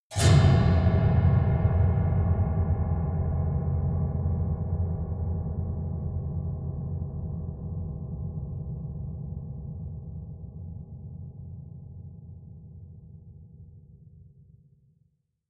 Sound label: piano twang multiple fast dull